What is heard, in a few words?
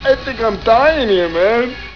s dying